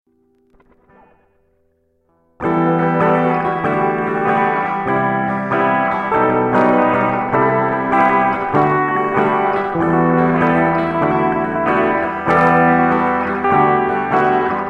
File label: guitar test